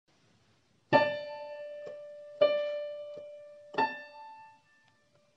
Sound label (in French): quinte